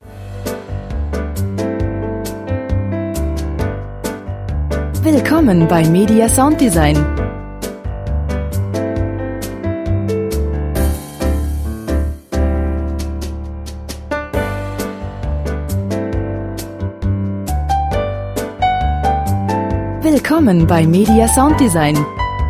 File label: jazz sunday